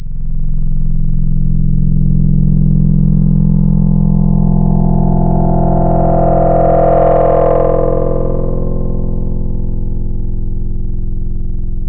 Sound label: One Shot BS Deep House, synth; Wavetables; Synth One Shots; Synthetic's Serum Collection; ESW Core Wavetables; Echo Sound Works Core Tables